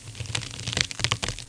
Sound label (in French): oeuf